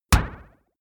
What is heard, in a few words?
sci-fi metal hit wobble; whooshes rises; hits impacts